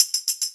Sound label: hats oneshot dance, Hat; Pop; Drums; Slate; SD PASADENA Pop Sample Pack